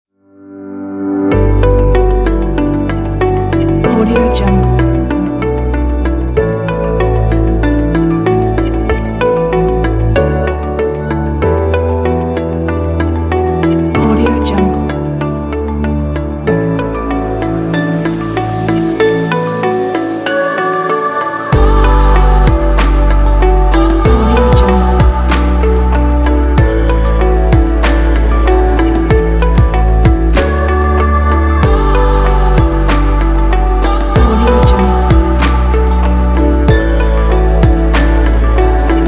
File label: timelapse kit